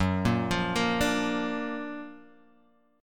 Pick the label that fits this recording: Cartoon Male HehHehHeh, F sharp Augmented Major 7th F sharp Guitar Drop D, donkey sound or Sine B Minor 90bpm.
F sharp Augmented Major 7th F sharp Guitar Drop D